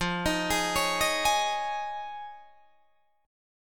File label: F Minor 7th Sharp 5th, Guitar, DADGAD